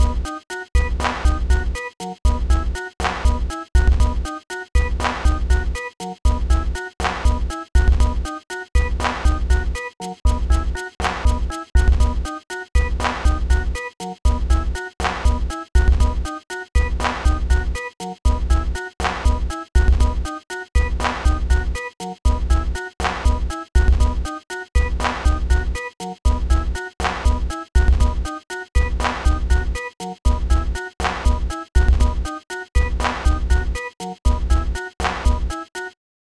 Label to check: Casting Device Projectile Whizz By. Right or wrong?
Wrong